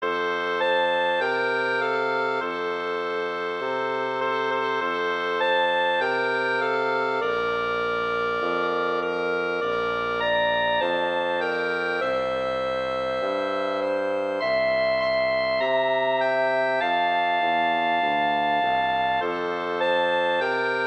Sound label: Jingle Bells, Christmas